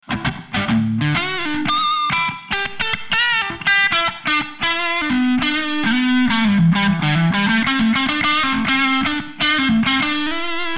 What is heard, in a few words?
blues lead